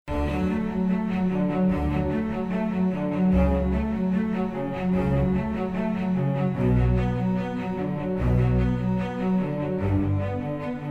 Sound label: Corporate